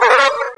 attack

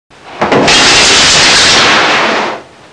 CLOSE SH, HOUSE

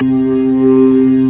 B3 Dist Slow D#3; SoundFont - The Developer's Choice - Volume 1; b3slow

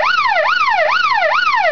Siren

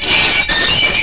metal

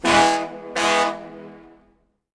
HORN, Seaport; PC Gamer IT CD 40; zones

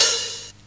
HIHAT OPEN LONG; DRUMS; HI-HATS

